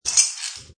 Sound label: Metal Wrench move; Metallic; Lynne Music